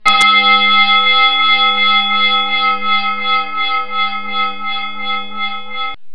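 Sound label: bell, Roland JX3P; Drums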